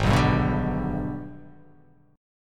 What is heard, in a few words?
Minor Major 7th Flat 5th; G#mM7b5 strummed; JGuitar